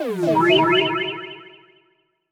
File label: TRAP TRANSITIONZ